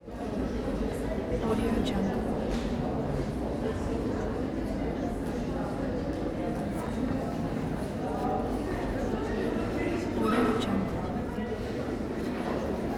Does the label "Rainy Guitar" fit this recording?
No